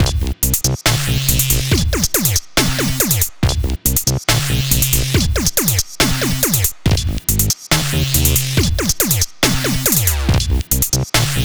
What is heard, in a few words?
New Drums Not Vanilla